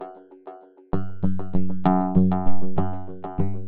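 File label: Space rock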